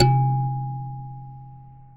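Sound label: C2 kalimba right; WorldSounds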